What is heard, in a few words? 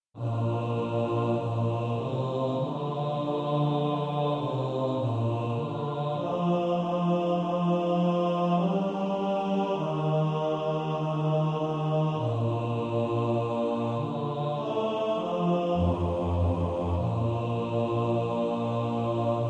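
bass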